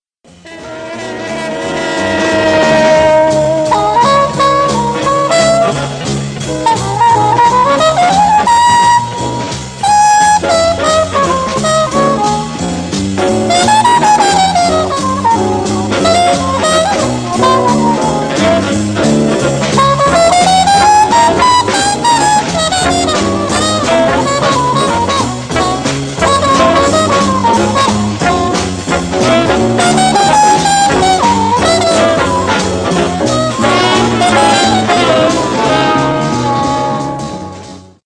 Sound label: Solo slow